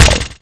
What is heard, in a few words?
fall; Alien Arena; droideka